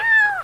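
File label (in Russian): котенок просит чтобы его накормили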